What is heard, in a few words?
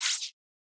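attack sparklight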